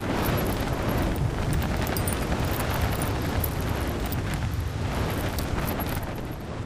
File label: ground pound wind rush; Titanfall 2